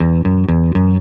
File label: bass blistering; Loops; Phonky